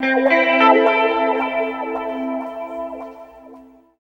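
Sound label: guitar, left; East West - Phat & Phunky CD2; 90's Sample CDs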